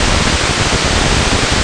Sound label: NOISE CAT, Analog; USB Soundscan vol.49; Analog Fantasies; AKAI; PROPH